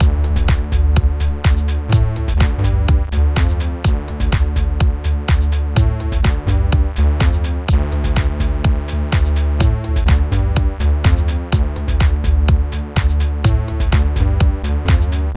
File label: 8bar